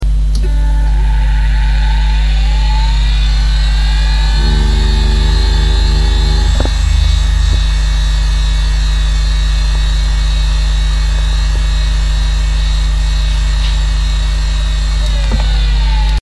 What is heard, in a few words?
seagate old; Почему у специалиста по восстановлению данных должен быть хороший слух?